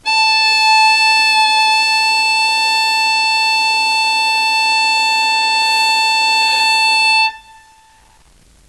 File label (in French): violon la